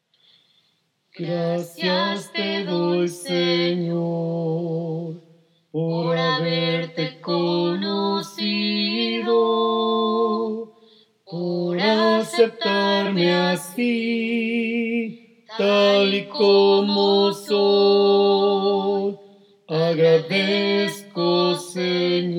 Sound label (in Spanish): Tenor